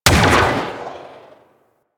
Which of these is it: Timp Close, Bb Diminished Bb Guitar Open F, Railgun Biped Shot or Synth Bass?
Railgun Biped Shot